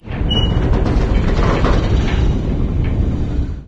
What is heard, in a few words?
bin squeak long; ambient; source engine; ep2; outland